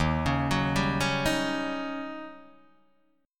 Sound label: Eb 7th Sharp 9th, Eb, Guitar, Open D